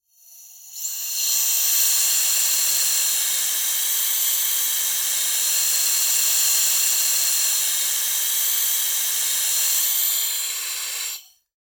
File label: Bug Bomb Release, Pesticide; Extermination, Insect